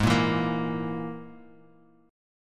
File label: G sharp Suspended 2nd Sharp 5th G sharp Guitar Half Step Down